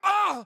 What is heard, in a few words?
AHurt